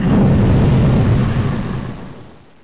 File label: fire; Monster girl quest Part1,2,3; Visual Novels